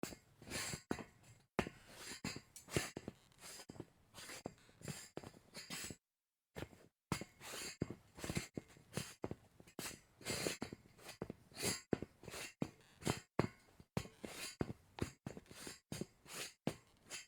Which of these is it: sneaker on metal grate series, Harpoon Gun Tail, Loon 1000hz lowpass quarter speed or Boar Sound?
sneaker on metal grate series